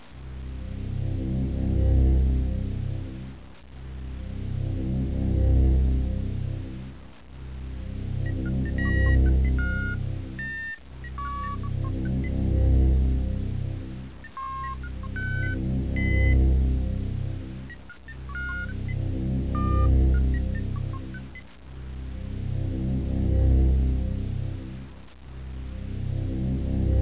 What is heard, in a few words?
wood break